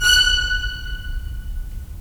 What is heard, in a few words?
Violins; Roland - LA Composer vol. 4; 90 s Sample CDs; Violins Sh